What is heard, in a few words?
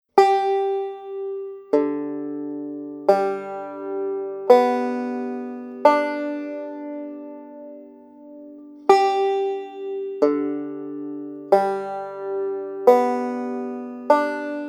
G Tuning gDGBD